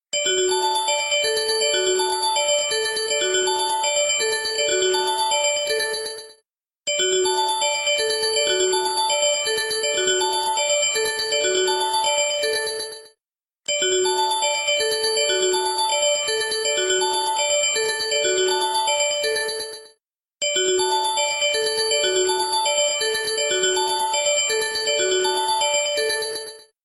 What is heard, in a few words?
Signal Slow